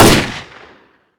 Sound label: aks74 shoot; STALKER